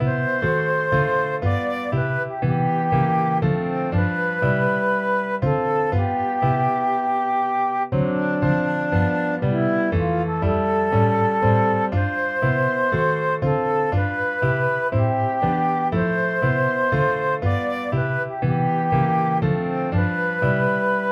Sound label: jazz standard